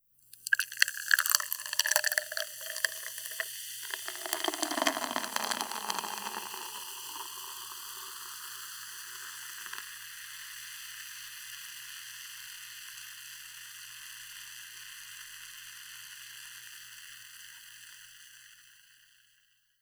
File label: filling beer into glass